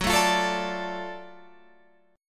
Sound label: Gb Minor Major 7th Sharp 5th Gb down Guitar Open F; strummed